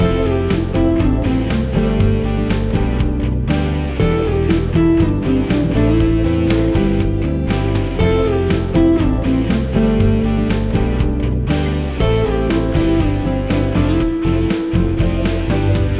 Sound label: BGM ADV PEACEFUL; beyblade x xone; switch windows